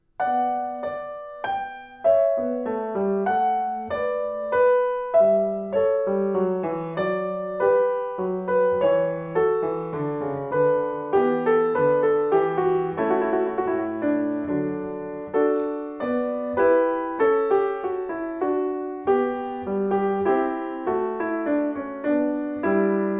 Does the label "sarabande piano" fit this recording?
Yes